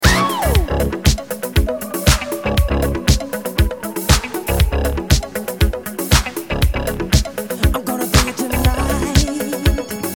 Bass

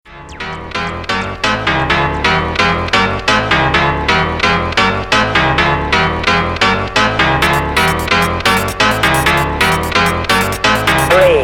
Synth

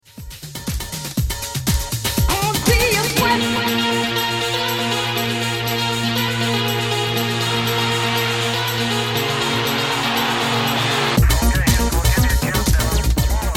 Synth

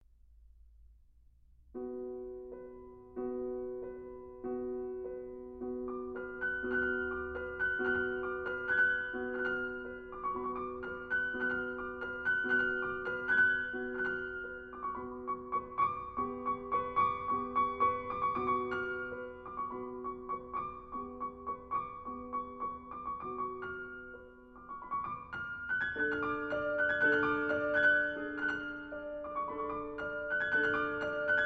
Roumanian Folk Dances, In One Spot, Solo Piano; Bartók; Complete Solo Piano Works

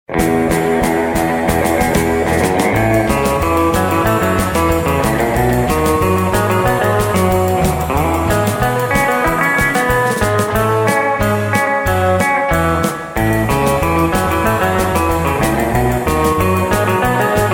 Guitar; Guitar Mania Vol.20

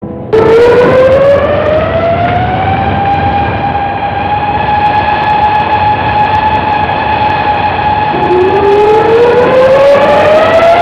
Noise

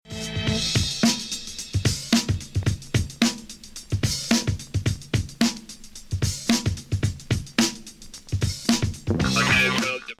Beat

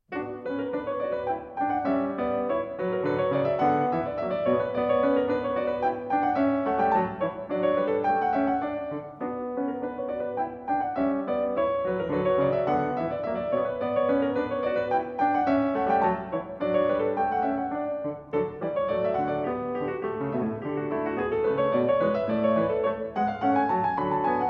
French Suite No.3 in B Minor, Gavotte; BWV 814; Vladimir Ashkenazy; Bach French Suites, BWV 812-817

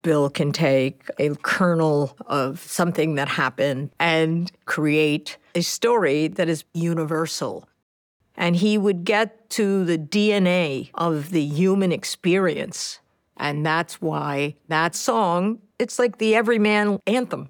Intro Piano

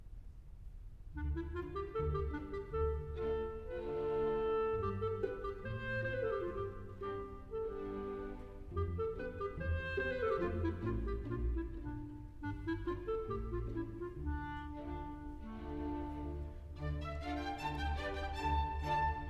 Roumanian Folk Dances for Orchestra, Sash Dance; BB 76 (Sz. 68); from Egres; Chicago Symphony Orchestra; Solti; Bartók

